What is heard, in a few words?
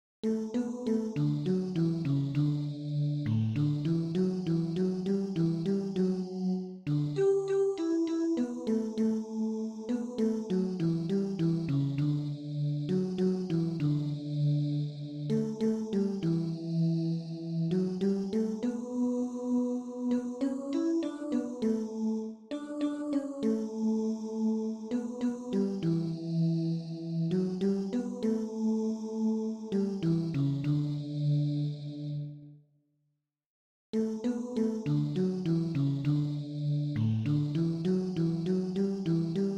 Basses